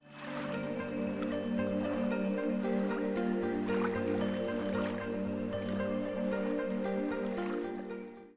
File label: ambiance